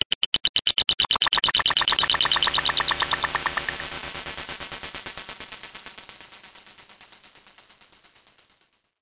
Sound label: insectoid clickspace